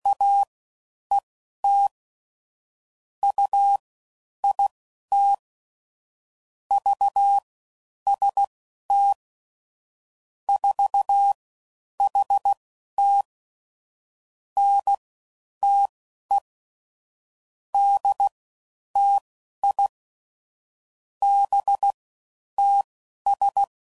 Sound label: CW Comparisons Broken Characters, CW Code Groups 5WPM; Morse Code